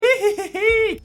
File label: laughing